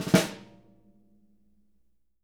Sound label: snare ruffs, JZ XSNARES